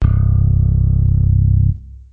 real bass; Basses

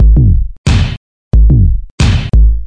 090 bass drum festival

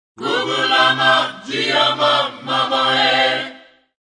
african vocals gubulah high

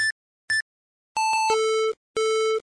beep beep bopbop bop bop

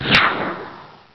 Bottle Rocket

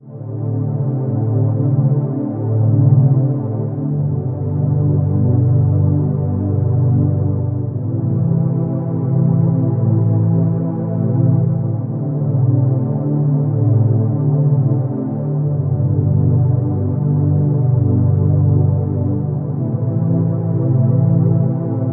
125 Pad; Optimism

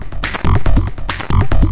140 hexbeat, DRUM LOOPS and BREAKS

